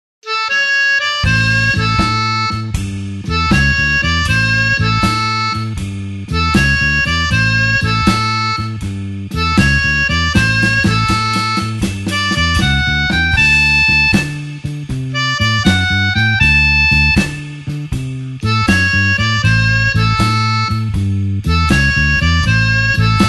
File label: blues harp shuffle A